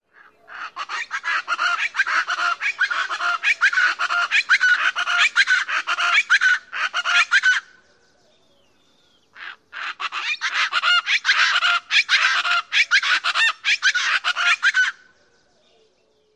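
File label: pair calling, plain chachalaca; TX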